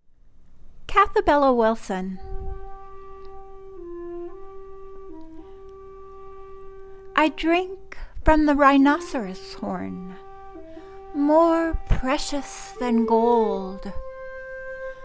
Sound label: Rhino Horn, China